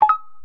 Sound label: correct